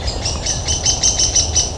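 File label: downy woodpecker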